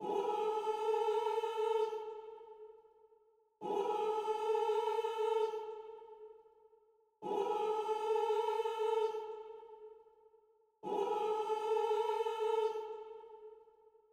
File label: 133 BPM A Min Vocals, Drill; Melody; Loop Stems; Cymatics; 2022 Melody Collection; Smoke